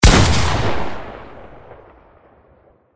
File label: spas shot